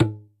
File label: Tabla, Percussion, Yamaha DTXpress